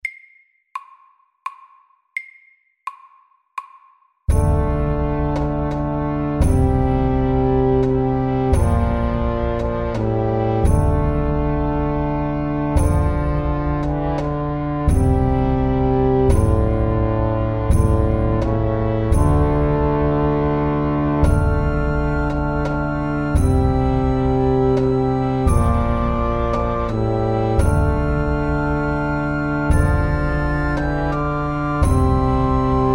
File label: Hemiola, 85